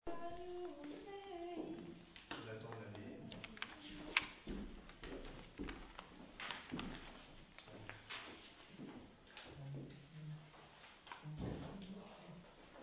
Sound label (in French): Plusieurs voix; agnus st jean